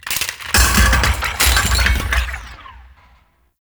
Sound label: Necramech Ranged Death Fall; Enemies; Warframe